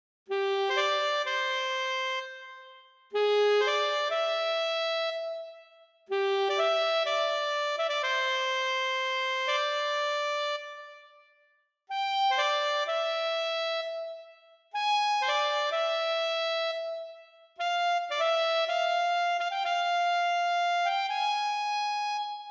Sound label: saxophone